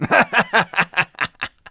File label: laff; deathlegends